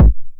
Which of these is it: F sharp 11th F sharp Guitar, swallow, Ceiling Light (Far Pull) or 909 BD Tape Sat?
909 BD Tape Sat